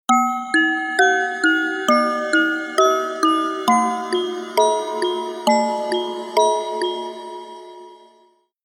Music Box Game Over

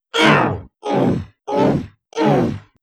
cyborg hurt

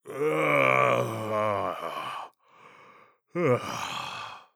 Sleepy; Paladin

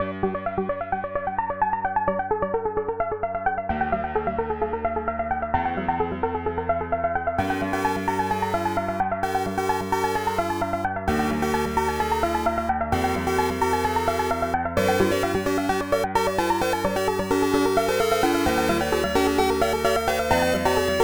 techno

